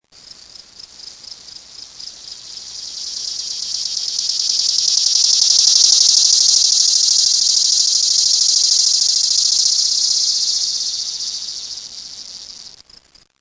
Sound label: T chloromera, cicadas